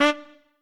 Trumpet melo

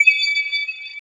se bonus; 东方Project; th16

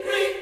choir

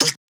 vox

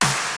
Snare; 音MAD; 学园孤岛

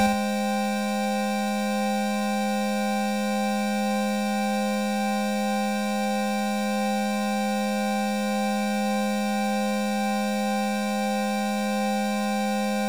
bluescreen; 主播女孩重度依赖

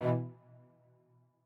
strings

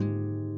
harp

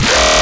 bass

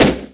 [Drum]; 音MAD; 少女终末旅行; 소녀 종말 여행 음원팩

